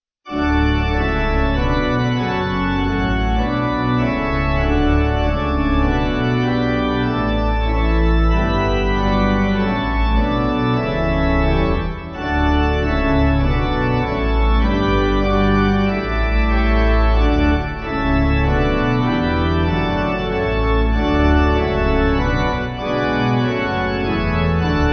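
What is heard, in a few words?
Gloria Acc Pipe, Pipe Organ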